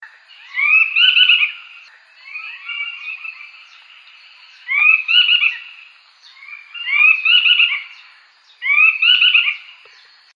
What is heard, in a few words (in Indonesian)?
cekakak biru hitam